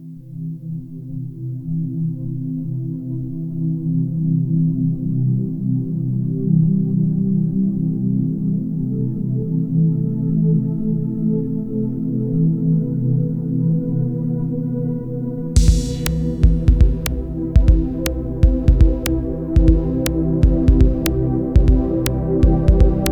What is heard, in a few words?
transwave mult timbr